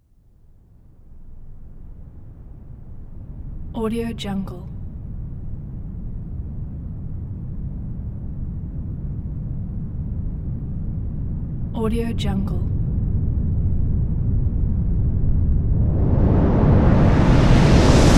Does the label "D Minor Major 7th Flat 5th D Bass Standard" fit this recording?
No